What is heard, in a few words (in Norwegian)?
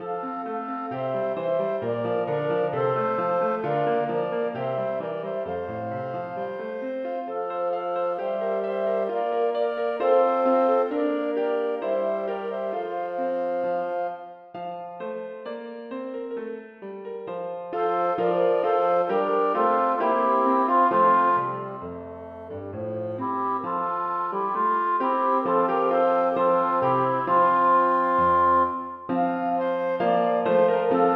Engels Dantz for to klarinetter, fagott og piano; Bang